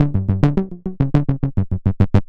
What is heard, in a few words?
808 Loop Toms 105, Roland 808 Drum Loops, 105bpm